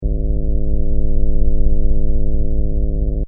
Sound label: Scifi SFX Loop, Short